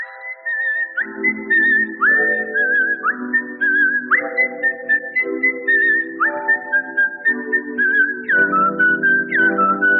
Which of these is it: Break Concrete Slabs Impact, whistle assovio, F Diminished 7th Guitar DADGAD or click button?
whistle assovio